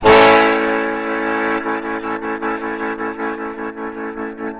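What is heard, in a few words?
Diaphragm Vibrato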